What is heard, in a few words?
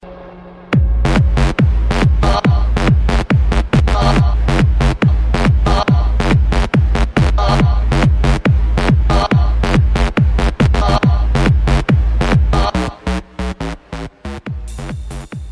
Eclipse Ringtone